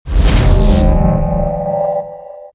magic powerup

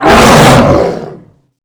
shout long; morbus

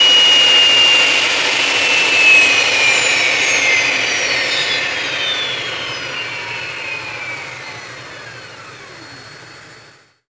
jet stop; jetvehicle

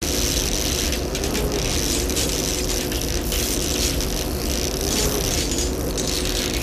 damaged loop; gow; silverback

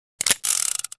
bull draw, raging bull

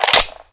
mg42 cover down; weapons

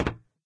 footstep; doom3; commando

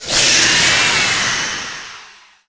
spider monster ouch strong

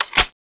clip in

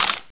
clip in; tfa cso; m249p

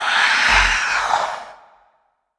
attack

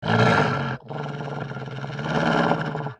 growl, dog

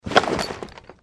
wear helm

